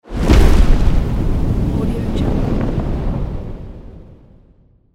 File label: epic explosion